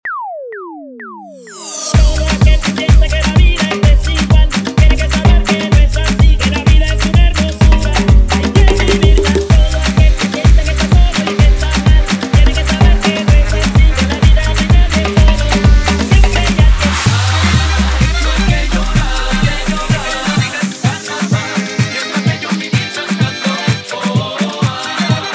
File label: intro outro 127 bpm